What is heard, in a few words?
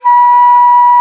alto bon, India winds; E-MU Producer Series Vol. 7 – Old World Instruments